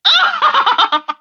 vox laugh small

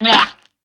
level bird stretcher garbage

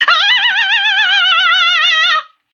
vox operatic death

